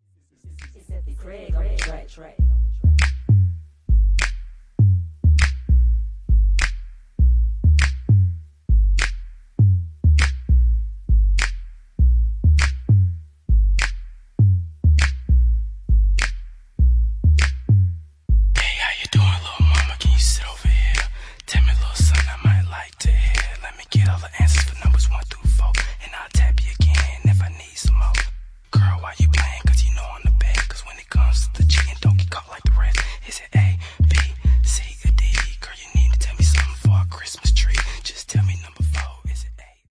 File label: parody